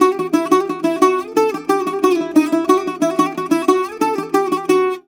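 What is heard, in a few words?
F#, bouzouki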